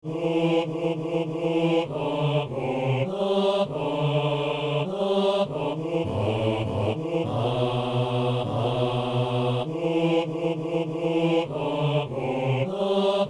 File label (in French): basse